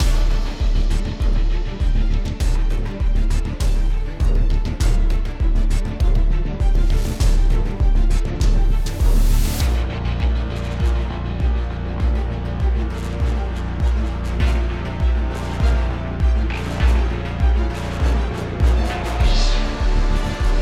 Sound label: Action Street; The Amazing Spider-Man 2